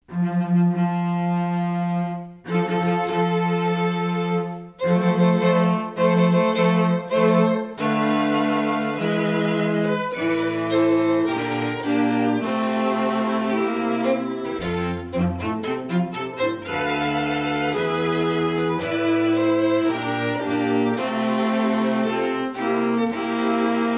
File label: strings wedding march; carnevalstrings